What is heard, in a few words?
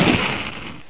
Dissolve Block; Greebles 1.0